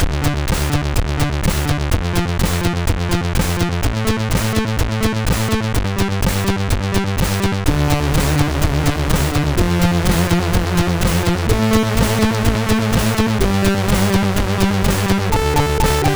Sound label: Funky Music, MOS6581R3; sid